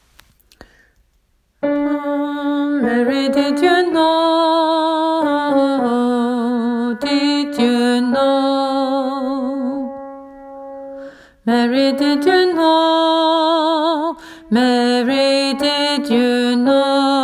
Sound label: mary tenor; åfjord